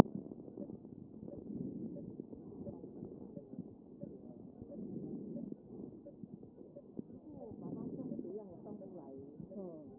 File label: chest